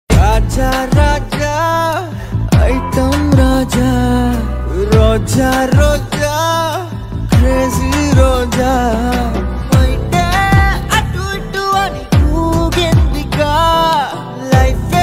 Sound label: telugu ringtone